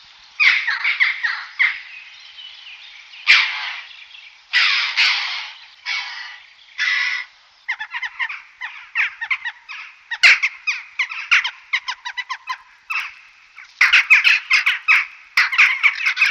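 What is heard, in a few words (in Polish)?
kawki, krukowate - corvidae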